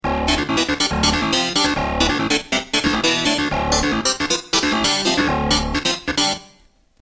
Clav; dx7

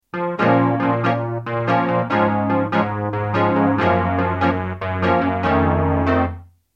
Wurli Box

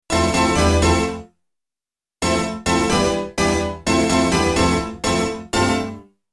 KEYS; d-50

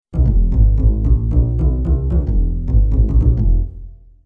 Bass; d-50

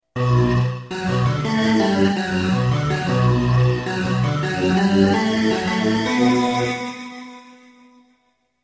perc vox

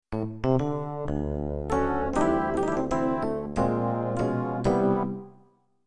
RHODES MARK V

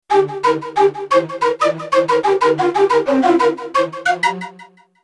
Surprise Pan Floot

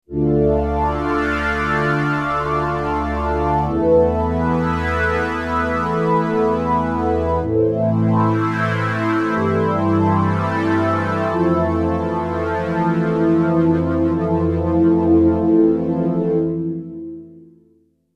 stereo Moog

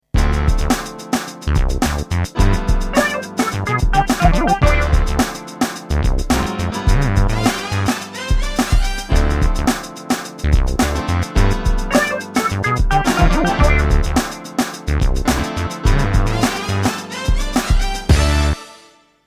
Jingle

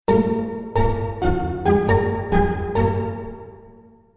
CLUB HIT

